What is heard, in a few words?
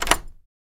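Dead Bolt Unlock Quiet, lock & keys; Dead Bolt Unlocking Quick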